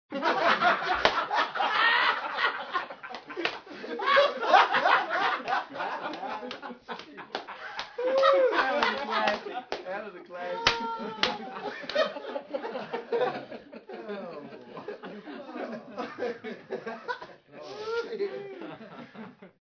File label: Laughing all together